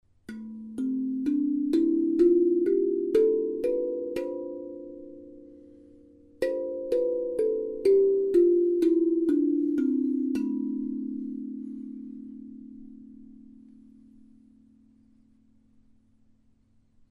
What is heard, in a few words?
Ionian scale hands